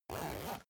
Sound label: zipper use short